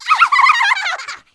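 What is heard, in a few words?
ghost attack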